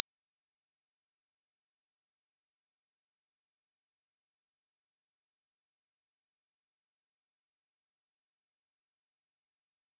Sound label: sine test